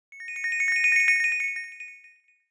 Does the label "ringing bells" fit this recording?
Yes